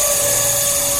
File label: Lift Simple Loop